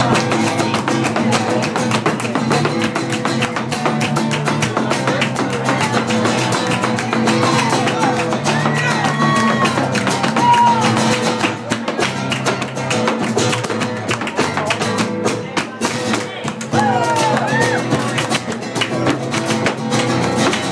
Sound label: sounds of flamenco